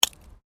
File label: Digging Small Handheld Tool Rocks